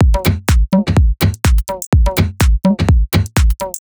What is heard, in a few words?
C# 125bpm, House Drumloops; 90 s Sample CDs; Zero-G House Fabrik Drumloops; ZG Drumloops